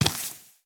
脚步声; 尸壳

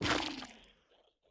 幽匿破坏声

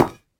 下界合金踩踏声

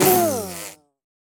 蜜蜂死亡声

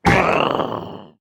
潜影贝死亡声

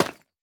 凝灰岩砖放置声

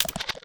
嘎枝脚步声

